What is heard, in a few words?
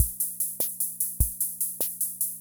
Kent K-220 Rhythm System Loop, drum machine